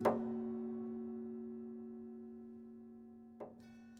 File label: Prepared Piano